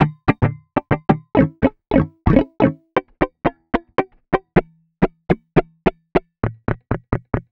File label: Guitar Strum Noise